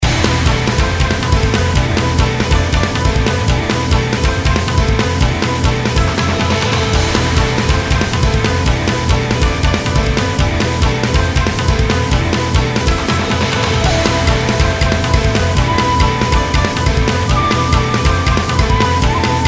Raid; Mist Train Girls

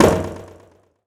Rim Hit

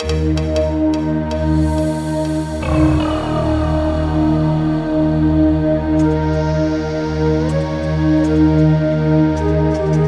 Loop; Acquaria

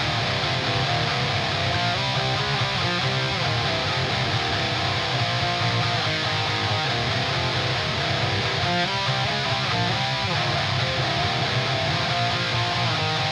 Drums and Jingles Camping Guitars; Metal Tales Fury of the Guitar Gods

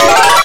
musical chairs, hit target; Spanish

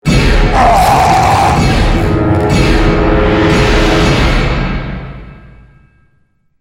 Stng Lrg Zombie

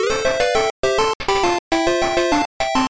Unused Jingle; ougon yuusha goldran himitsu daihyakka pico gamerip 1995

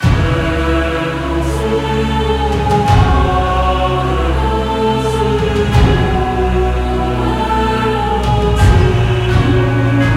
God Battle

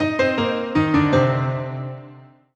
Solo Piano Negative; MUSIC EFFECT; startide

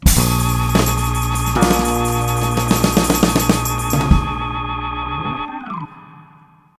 Bass Ending; Bikini Bottom Brawlers; Bass Vegas

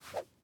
throw

